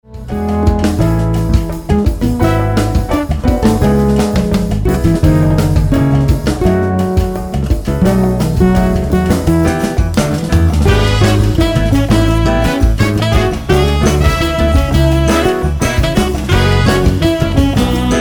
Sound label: SALSAMBA